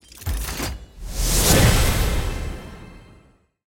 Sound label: module unlock